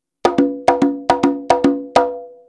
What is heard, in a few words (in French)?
frise, congas